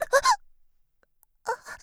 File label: die; Eternal Return; Tia; Voice Line; KOR